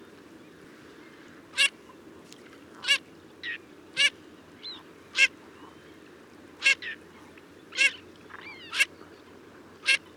cyranka zwyczajna spatula querquedula

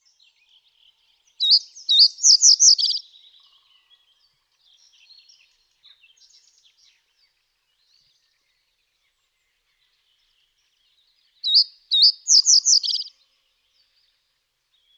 potrzos zwyczajny emberiza schoeniclus

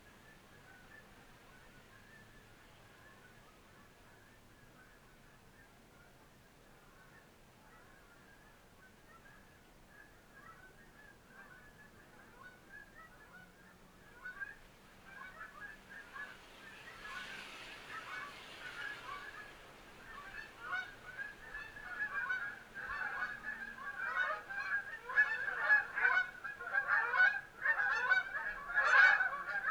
bernikla kanadyjska branta canadensis

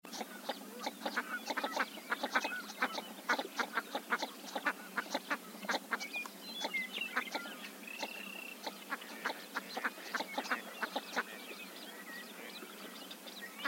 plaskonos zwyczajny spatula clypeata

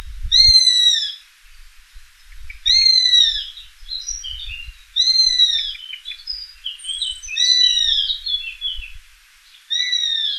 krogulec zwyczajny accipiter nisus

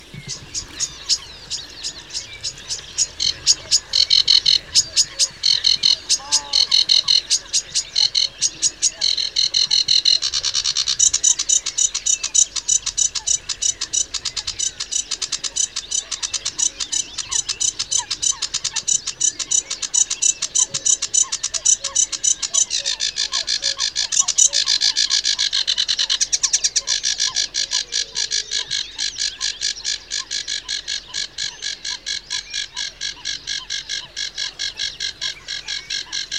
rokitniczka acrocephalus schoenobaenus

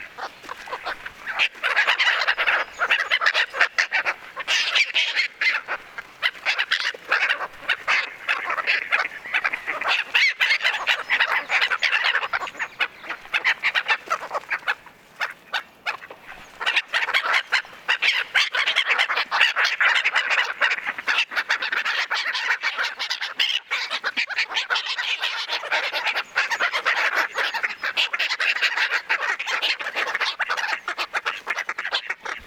mewa smieszka chroicocephalus ridibundus

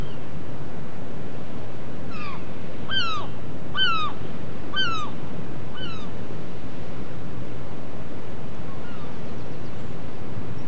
mewa srebrzysta larus argentatus

